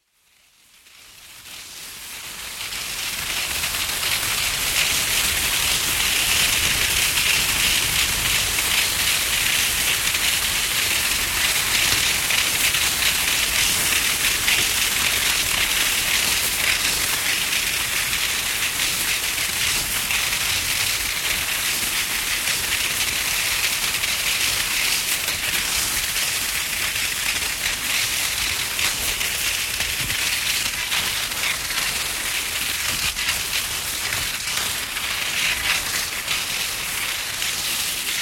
Skiing, Skiing, downhill; Skiing And Snowboarding; Sports; BBC

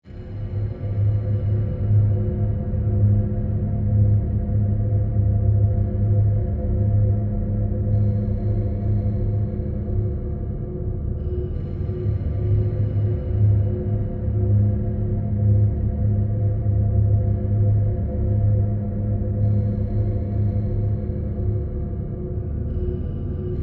Drone Haunting, Reverb; Drones; BlastWave

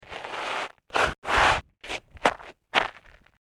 Stepping On Ice And Snow; Snow Sound Effects; Andrew Fleming

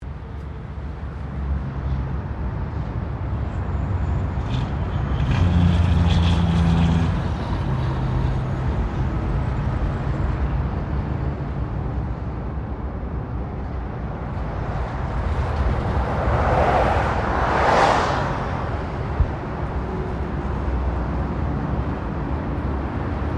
Traffic Cars Freeway Pass By

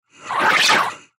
Transition Multimedia; Musical Element Low Melody Production Element Imaging Element Accent Transition; Technology; Miscellaneous Musical; Blastwave

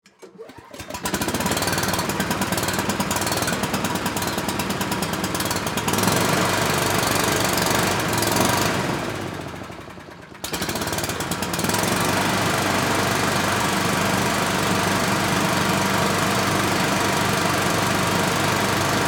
Blower On Run Off, Billy, Goat, Pro, Series; Machinery

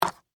Game Dice Up, Pick, From, Board; Sports; Board Game Sound Effects

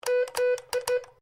MORSE CODE Z; Morse code aplphabete; Morse Code (Sound Effects:Electronics)

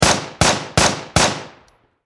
Machine Gun M4A2 Four Shots Close Perspective; Warfare

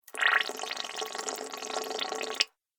Water Fast Little Bubbles

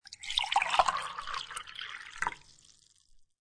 Pour Coffee Into A Coffee Mug; Pouring

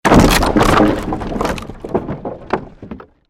created wooden structure crash medium

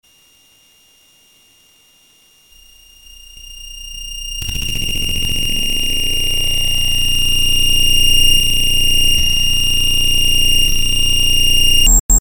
Feedback Static Ring Chopper Distortion Swells; Feedback Sound Effects

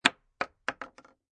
Bullet Shell Drop Shotgun 12Ga Solid Wood; empty Shotgun 12 Gauge shell falls on solid wood; Impact Sound Effects

